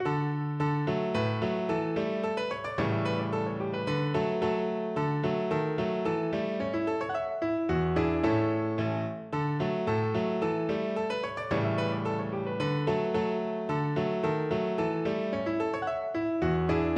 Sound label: rosita, piano